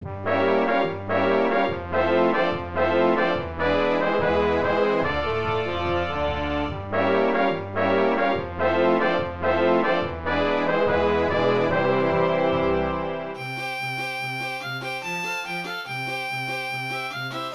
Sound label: Orchestral